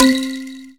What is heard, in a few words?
Ethnic Synths, Dance Synths; Best Service ProSamples vol.37